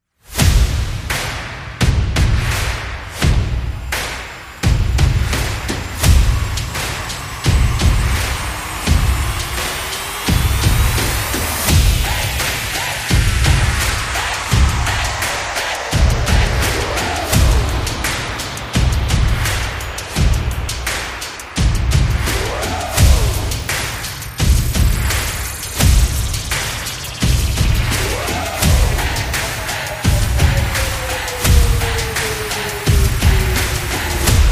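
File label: Tribalz, sport percussion and stadium anthems; football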